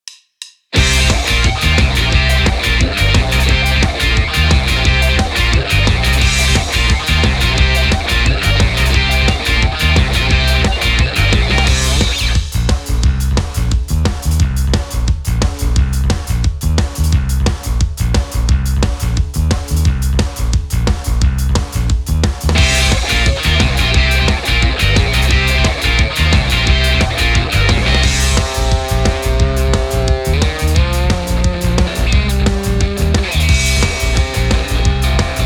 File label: Instrumental; 初音ミク